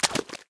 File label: clay land; Golden Crayon Sounds